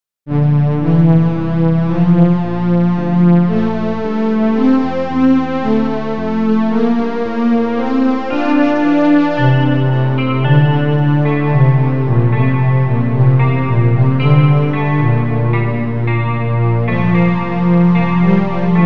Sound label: Ambient